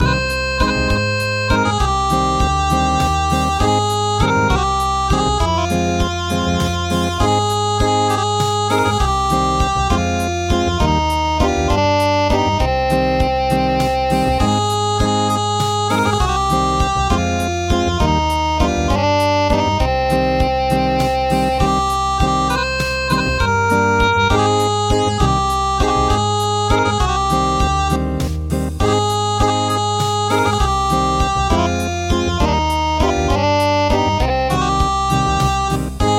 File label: Acoustic, midi